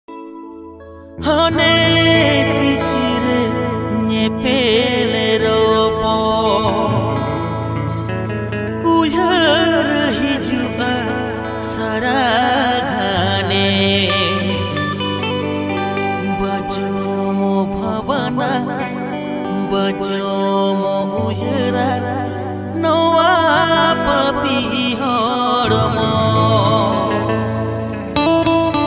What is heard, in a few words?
new santali ringtone